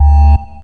ALARM; NIHILIST

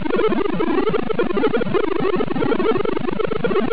Water; BoulderDashSnoop; ClassicC64

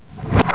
HAY FALL

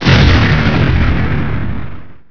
THRUST; NIHILIST

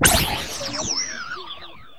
EJECT; cubase; ZXFX

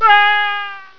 BAD BEEP; ANCIENT

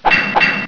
SWORD

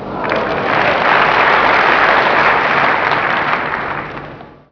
CROWD

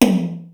HI POP TM; BEAT2000

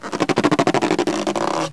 stretch

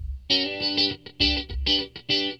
GUITAR, ROCK POP; MAGIX

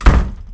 HIT

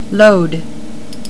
LOAD

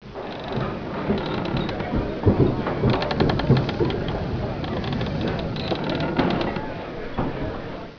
SEA

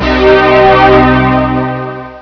alarm; Soap Star

